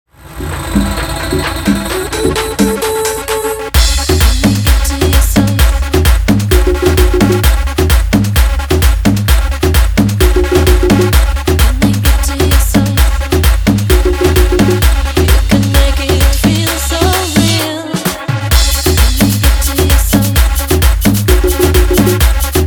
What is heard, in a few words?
guaracha remix 130 bpm